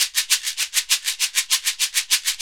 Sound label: SHEKERE, Synclavier Percussion; ILIO